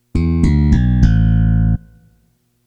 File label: bass